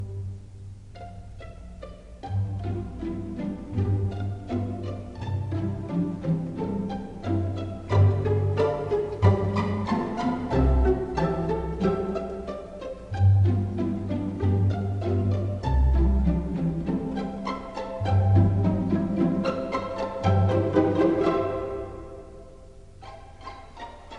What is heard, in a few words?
pizz polka; strauss